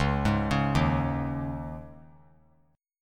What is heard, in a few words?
Db Suspended 2nd 3C,3F,3A,3D; arpeggiated; Dbsus2; JGuitar